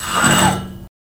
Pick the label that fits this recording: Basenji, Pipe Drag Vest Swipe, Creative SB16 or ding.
Pipe Drag Vest Swipe